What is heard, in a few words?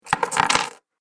Heavy Coins Dumped Table; Money Sound Effects